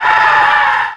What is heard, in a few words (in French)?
Cri